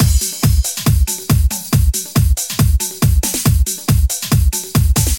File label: 139 bpm nineties breakbeat c sharp key, kick tuned to the C# note